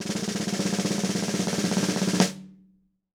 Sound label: roll, drum